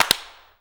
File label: CLAPS, SINGLE CLAPS; Best Service; World Colours